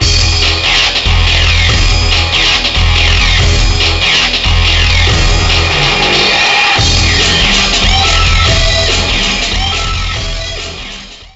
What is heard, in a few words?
Ditty; NBA ShootOut 2002; PS1